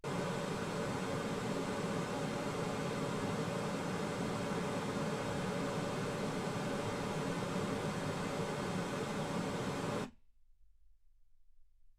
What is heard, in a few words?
pink noise 48kHz